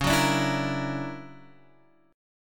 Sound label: D Augmented Major 9th Db, guitar